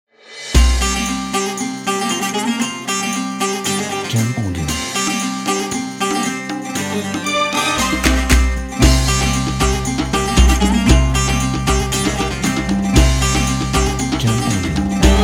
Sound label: Middle Eastern Logo; Middle Eastern Logo Pack